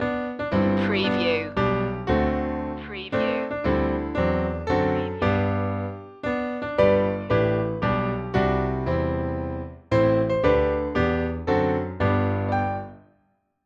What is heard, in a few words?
happy birthday piano lively